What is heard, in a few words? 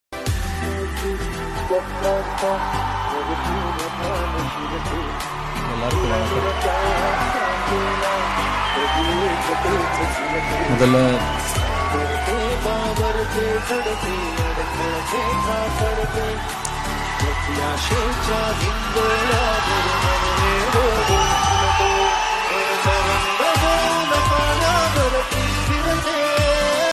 Marathi attitude status